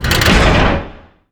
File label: metal door close